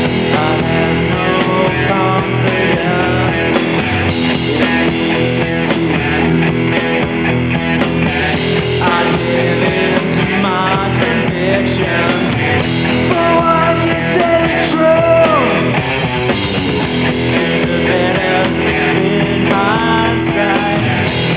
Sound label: pain